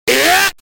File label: attack sound; shadow dio